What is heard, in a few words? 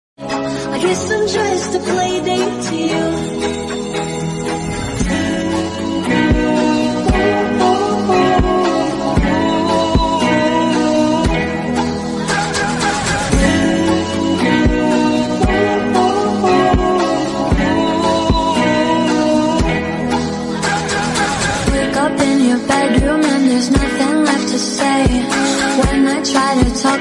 Hollywood Song Ringtone